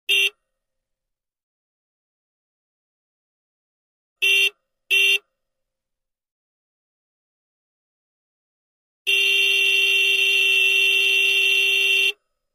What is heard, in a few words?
Motorcycle signal